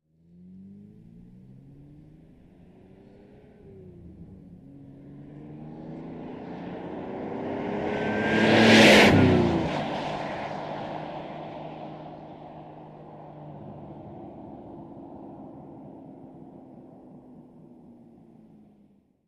Car Sports Car Medium

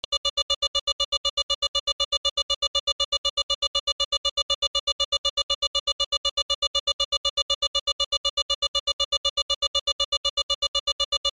Alarm Tone Short Fast

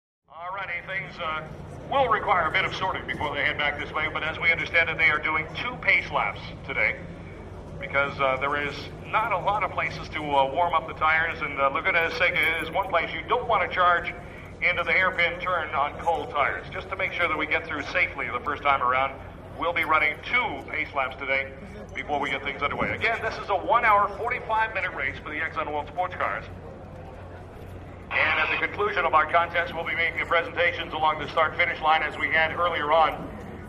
Pro Sport Race Laps, sports car

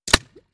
Bullet Impacts Water

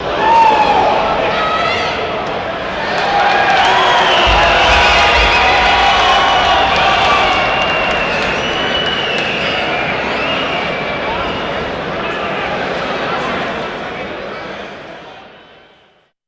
Crowd Yeahs Intro, big arena

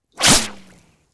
Bullets Slice Water

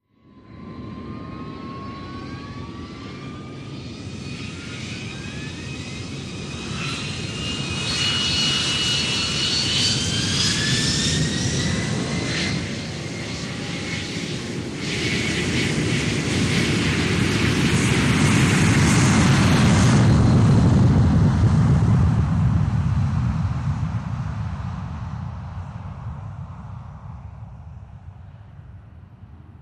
Small Jet Engines Wi, jets

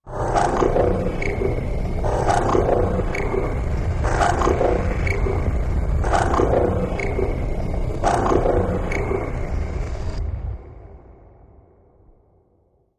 Eating Engine Machine, machines winches

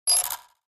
Button Squeaky Metal

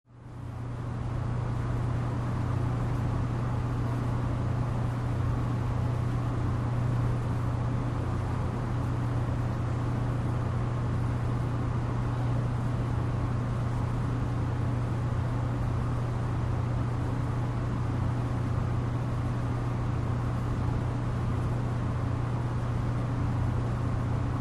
Heavy Machine Hum

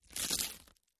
flesh tear skull

